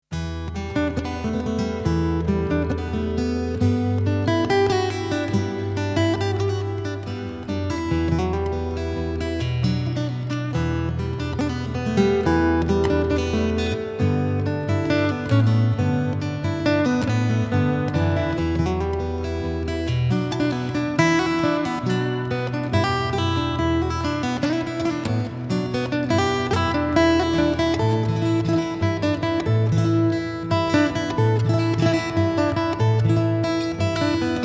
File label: Celtic Medley